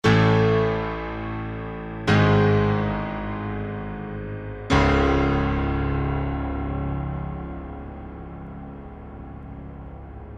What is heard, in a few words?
piano; apiano rachmaninoff